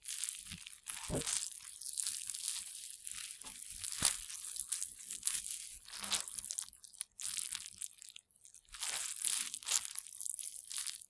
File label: loop drag slimy